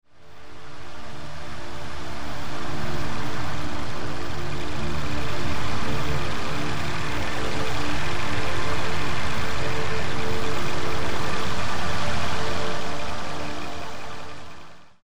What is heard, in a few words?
Ambient Combi